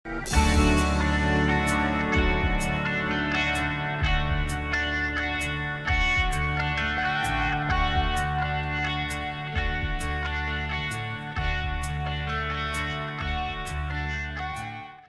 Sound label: leslie